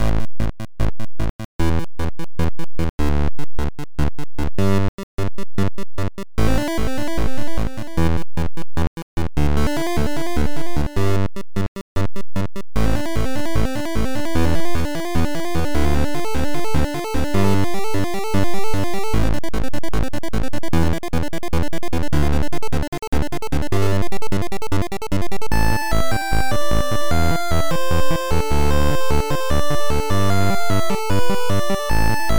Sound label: Dungeon Floor